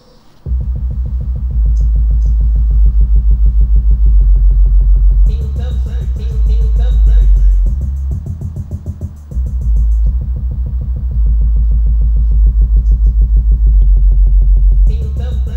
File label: bass